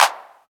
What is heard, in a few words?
clap single hit g sharp key; percussion; single hits one shots